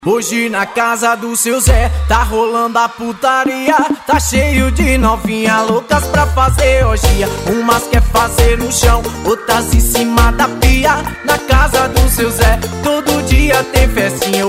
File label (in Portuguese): pagodao funk; total som; carnaval 2015